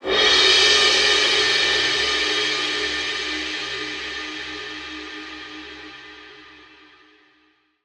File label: Crash Cymbal Am 70Bpm; Construction Kits; STEMS; Ghosthack; SkyRealm; Kit 20 - Sea Of Souls